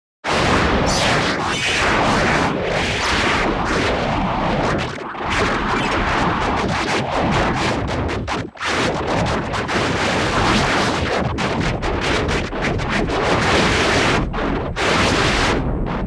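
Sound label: energy distortions explosion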